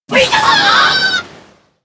lazer bird launch; Angry Birds Space

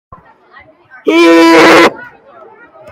heeee

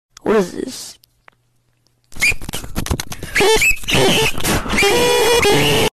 distorted scream

